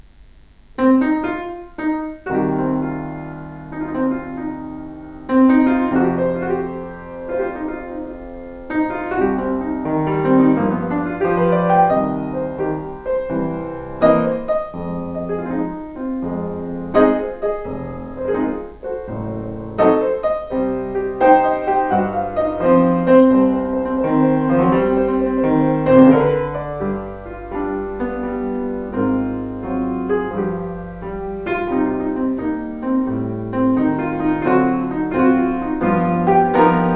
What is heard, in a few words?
Minor Blues Improvisation